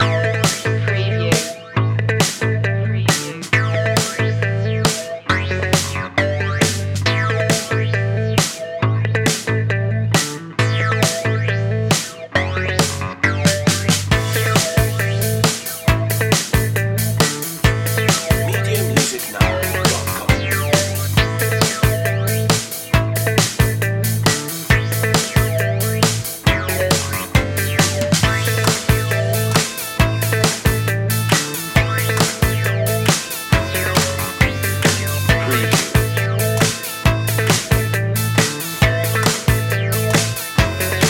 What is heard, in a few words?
An exciting indie electro-funk track. With edgy bass guitar, drivin; trendy style; funky groovy